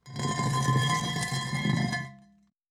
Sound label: Designed Grave Opening